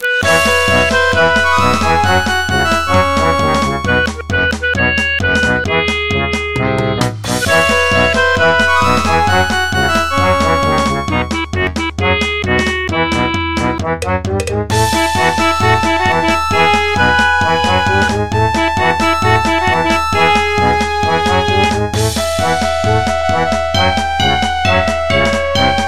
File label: Credits Theme; Catscratch; Nickelodeon